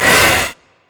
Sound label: spider attack; LoL